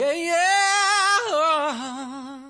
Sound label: fem eh yeah ohh, African Vocals Sung